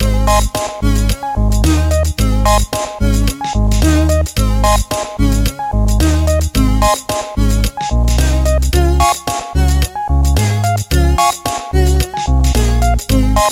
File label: Bittersweet Arpeggiator; novembeat 2016